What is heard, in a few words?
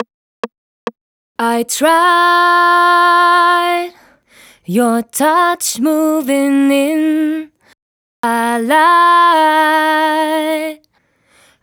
Chorus Variation, 138 BPM; Vengeance Samples